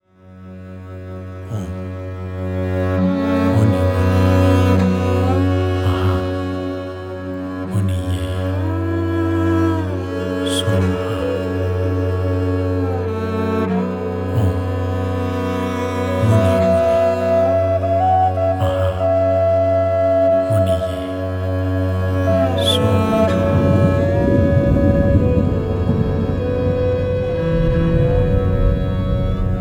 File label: Tibetan Meditation Music